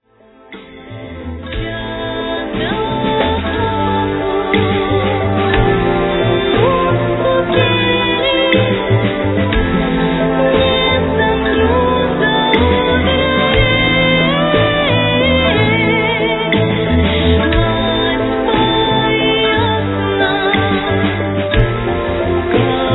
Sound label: new hymn